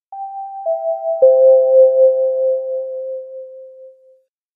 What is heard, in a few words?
notification